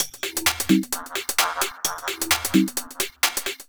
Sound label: 130BPM Full No Kick, techno; drum fx; Beats